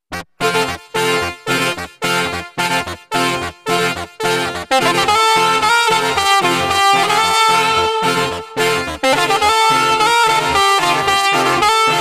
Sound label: ewi; theshonin